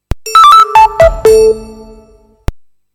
Gigaset GS160 shutdown

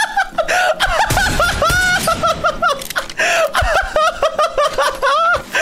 laugh

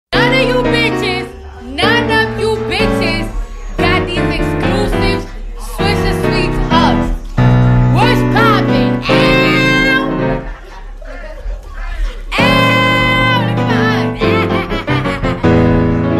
piano meme

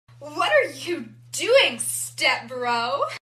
phhhhhhh

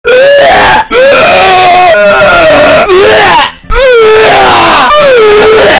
eeeeuuuurk

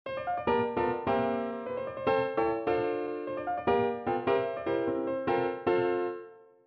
piano

